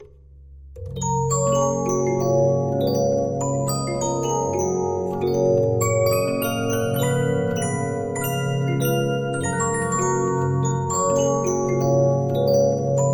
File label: Regina, 15inch disc musicbox